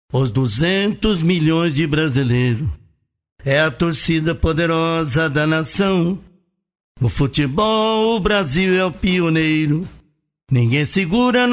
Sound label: acapella funk